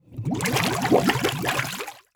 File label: Liquid Water Water Bubbles Blow Bubbles Into Water Short